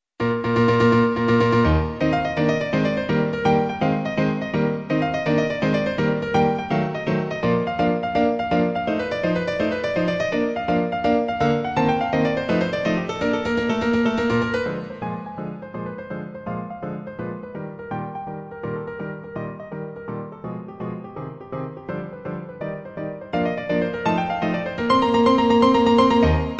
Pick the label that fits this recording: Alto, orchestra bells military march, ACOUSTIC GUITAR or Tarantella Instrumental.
Tarantella Instrumental